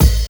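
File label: OHH, open hi-hat; E-Lab; X Static Goldmine 1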